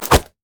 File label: basicattack; LoL; heimerdinger